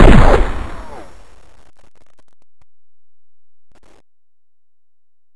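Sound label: aug fire silenced; weapons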